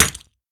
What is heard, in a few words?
weapon hegrenade impact; physics; weapons